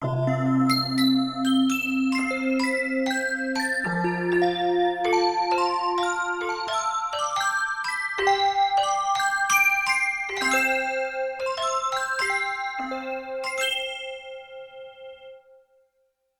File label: Handbell Delay-Shift, Yamaha TX16W; Wind Chime & Handbell